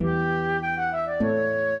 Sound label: minuet, flute harp; Mozart